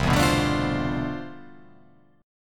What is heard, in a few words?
C Major 13th C